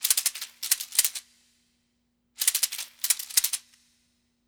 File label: Seed Pods, Ethnic Percussion; Sonic Foundry (Sony Creative Software); Ambient Atmospheres & Rhythms